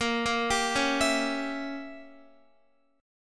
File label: A sharp Diminished 7th, Guitar; arpeggiated; chordshape